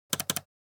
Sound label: Laptop keyboard keystroke pressing multiple